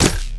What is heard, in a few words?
switchblade